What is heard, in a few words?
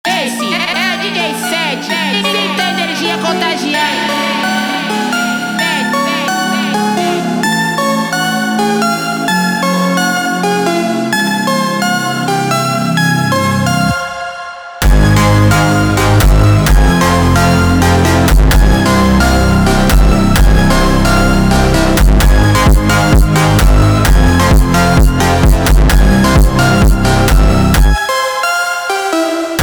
Funk instrumental